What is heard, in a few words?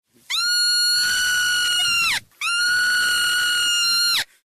scream